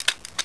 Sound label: winchester reload; wanted; weapons